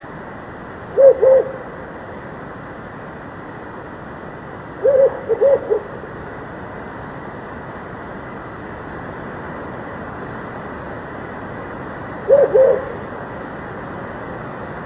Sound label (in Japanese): fukurou, singing